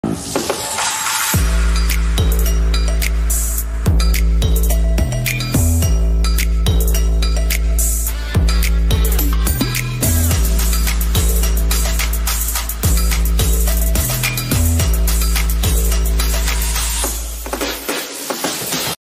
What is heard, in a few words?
BGM ringtone